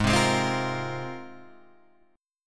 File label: G sharp 6th Add 9th G sharp